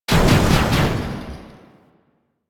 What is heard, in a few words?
Window Explosion; Warframe; minigames; Caliber Chicks minigame; boss